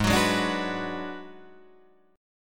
G sharp Minor 7th Flat 5th G sharp down Guitar Drop D